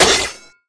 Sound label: Armour Helmet Hit Light; SFX Kit; Creaks, Scrapes, & Misc